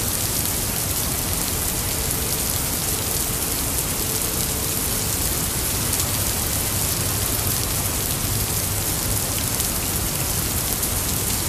Rain Storm; gamerip; escape from russia mobilization

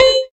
Combo

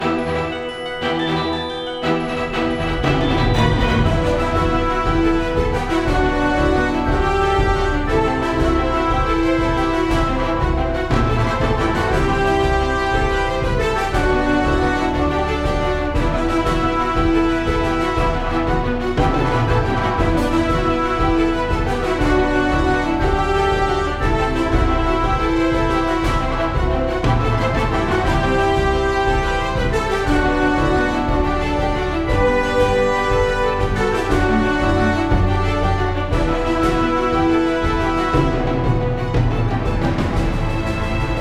music mines; Devoid of Shadows

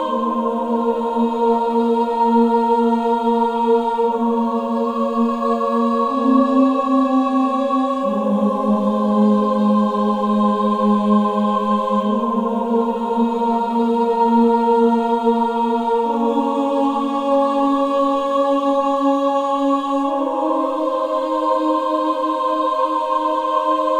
Church Loop Short; Eternal Edge

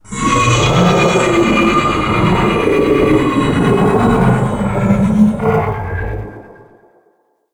Mega Beast Die; A Robot Named Fight

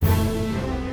Music Fight Pirates Theme Average Bpm128 Start; asterix obelix slap them all 2

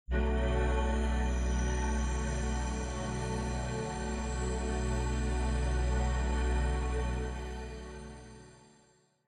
Puzzle Solved; Forest SFX

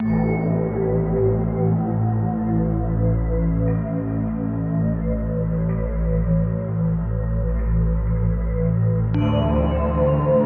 Horn Path Loop 105bpm; Furi; Windows gamerip 2016

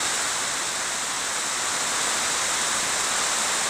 Sprinkler Loop

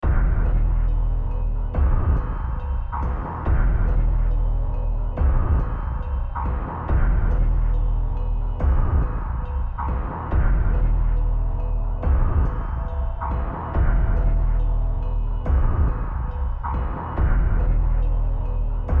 big drums; Shallow Space

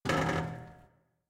Creak Metal Medium

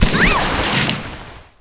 Windsurf Hit; Kelly Slater Pro Surfer; macOS, Windows gamerip

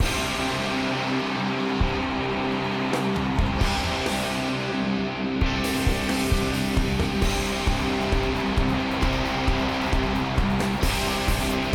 Intro Rock; Real Football 2011; Android, iOS

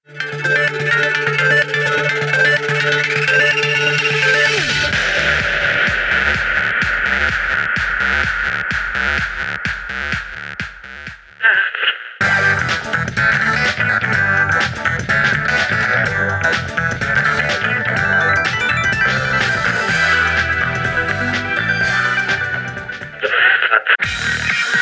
Electronica Medley; Skylanders 4 Trap Team; K-TROLL

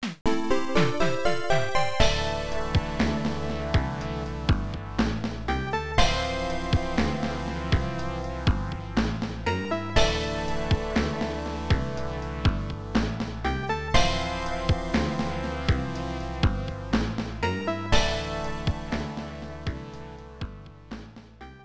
Continue; metal force; pc 9801; opna

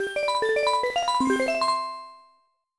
Correct Solution; Super Mario 64 DS; Wii U

